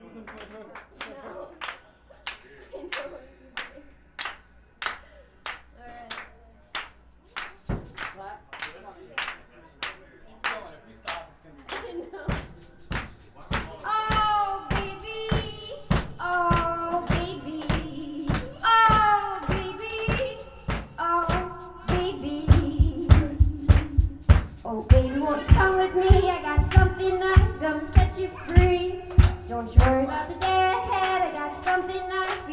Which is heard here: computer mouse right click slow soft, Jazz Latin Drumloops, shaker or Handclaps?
Handclaps